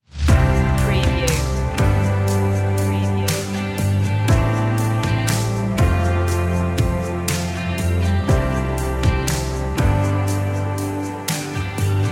no euphonium, pop ballad

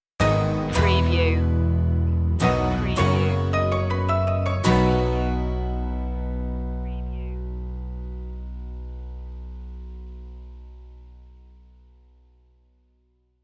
stinger, Music; talk show

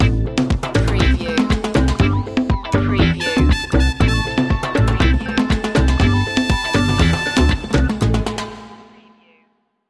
supercrush loop ending, latin spanish

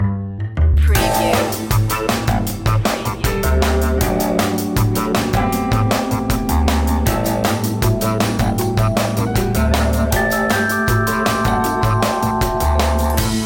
jazzy opener; faster jazz

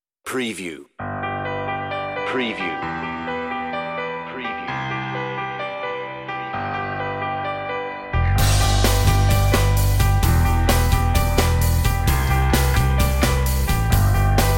on-hold music; MediaMusicNow

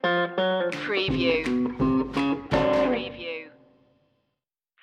fixin to score stinger; screwball comedy

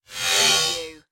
Whoosh; flange

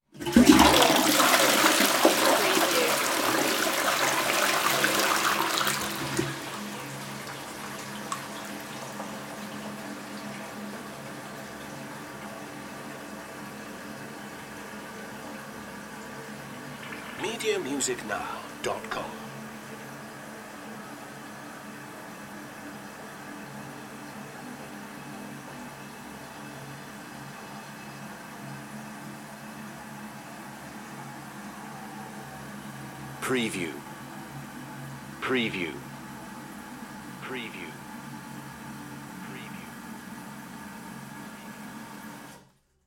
toilet flush; household sounds; bathroom sounds; the sound pack tree

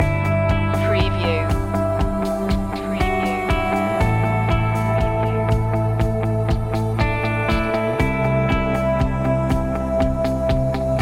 mountain mover loop

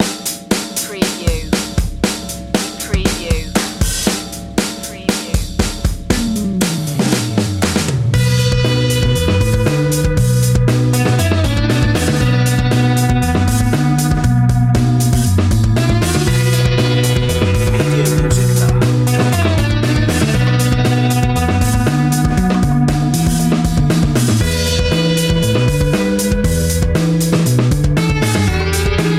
psychedelic burst, fusion

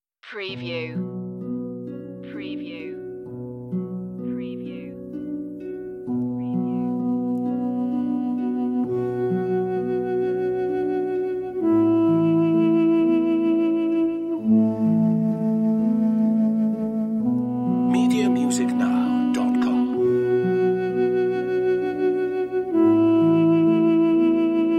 duduk

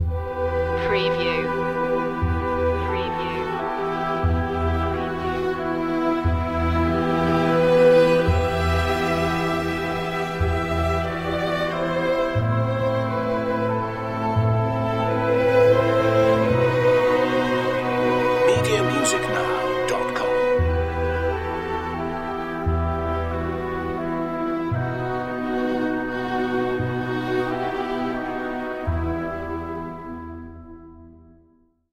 string woods, oboe; romance anonimo

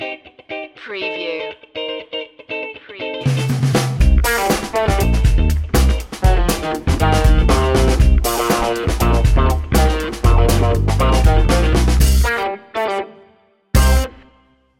groovy funk; clavinet

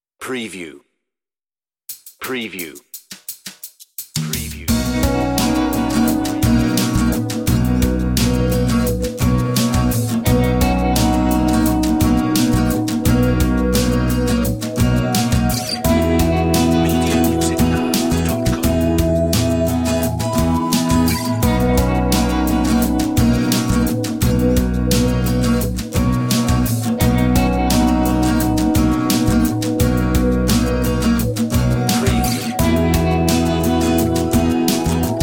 Happy Coincidence, on-hold music

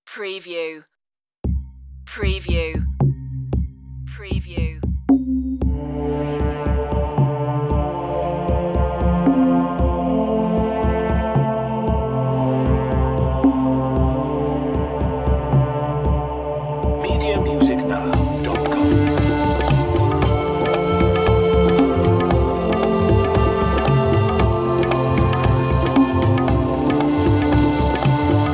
world pulse, bongo

